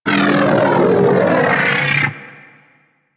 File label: Arch Gun Alt Charge Full, Weapons; Warframe; Archwing; Hildryn